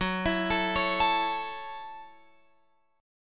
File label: Gb Minor 7th, Guitar Open F; Gbm7; arpeggiated